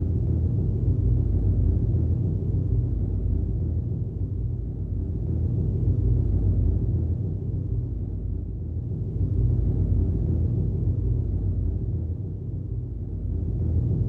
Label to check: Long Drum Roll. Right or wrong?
Right